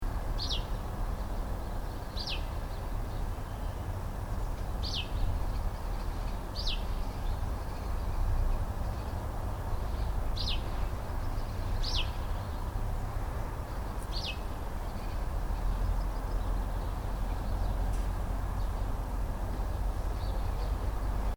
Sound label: Garden (Residential): Ambience, Birds, Slight Road In Background; Outdoors; Backgrounds; Outdoor Sound Effects; Stuart Duffield